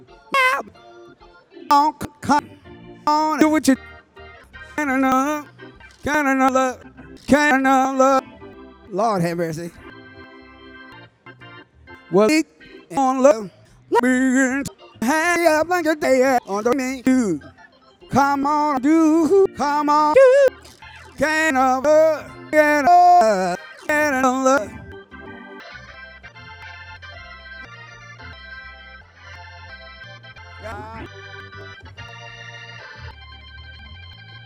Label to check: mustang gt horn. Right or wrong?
Wrong